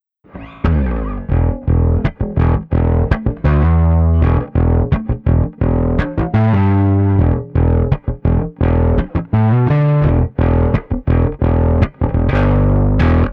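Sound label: dirt, bass; RIC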